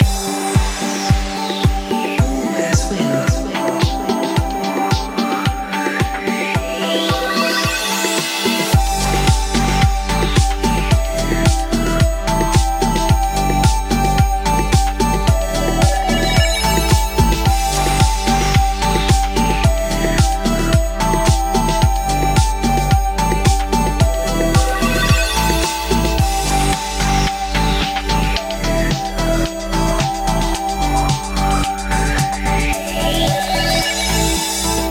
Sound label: free spin melody; Amatic; All Ways Win